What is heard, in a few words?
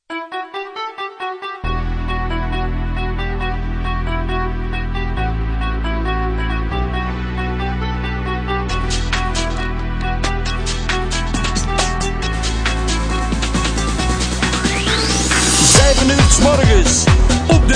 song